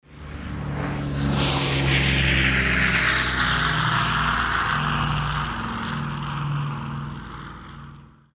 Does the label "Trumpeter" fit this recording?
No